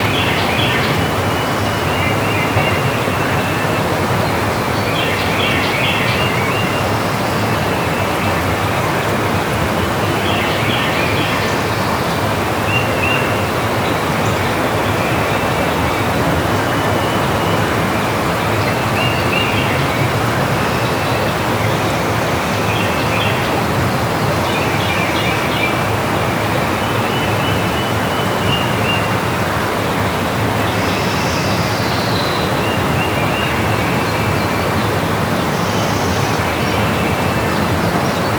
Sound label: Forestry